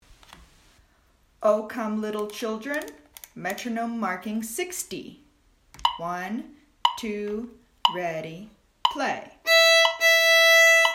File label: Oh come little children met 60